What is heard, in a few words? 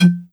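shells eject tube, super shotgun; weapons; doom; ssg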